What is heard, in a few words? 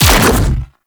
Electric Tether Shot; Warframe; Railjack; RailJack Weapons